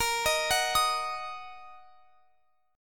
A sharp Suspended 4th Sharp 5th A sharp down Guitar Open F; arpeggiated